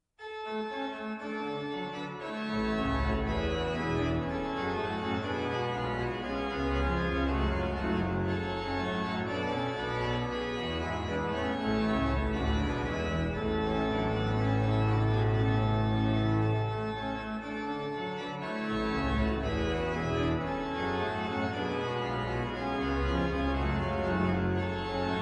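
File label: Tutti registration, organ; BWV 601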